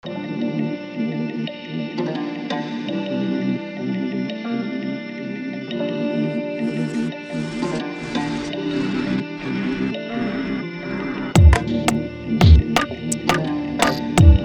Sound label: Afro Lofi